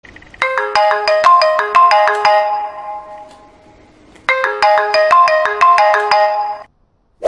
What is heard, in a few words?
marimba iphone; 3g 2008